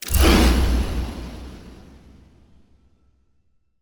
Evil Spell Cast

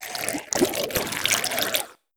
Creature Squelchy Sticky

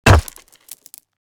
Concrete Rocks Crunchy Impact

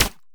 Sci-Fi Strike Hit Impact

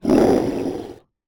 8 Bit Monster. Beast Roar

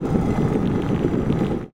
Slab Friction

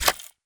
Short Movement Robot

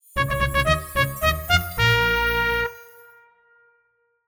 Musical Chimes Trumpet Victory

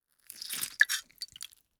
Lemon Squeeze Squelchy

